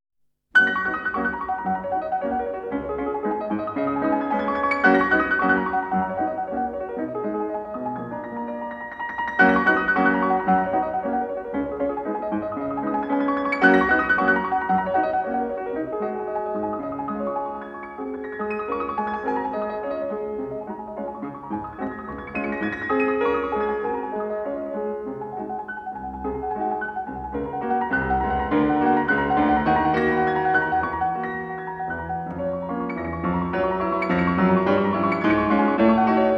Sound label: Etudes G flat major, piano